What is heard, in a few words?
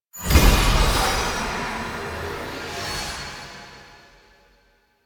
Horse Protection Ability; Vehicles; Duviri